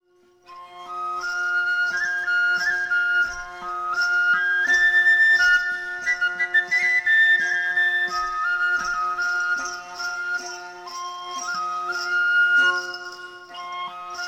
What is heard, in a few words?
troll pipe